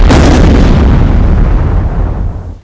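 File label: rocket explosion, weapons; ctf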